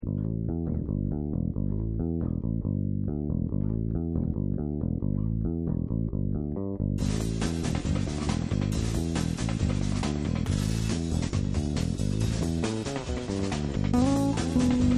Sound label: groove